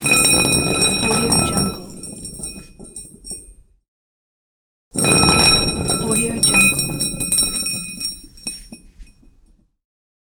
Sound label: metal pipe short thick rolling